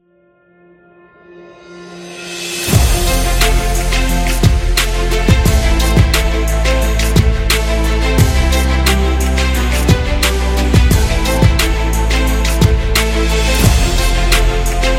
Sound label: Epic Hip Hop; cinematic action